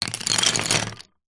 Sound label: office pens pencils handful set down table; zapsplat